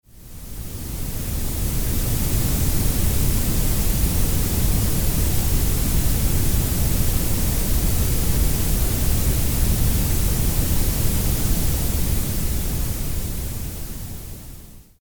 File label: Purple Noise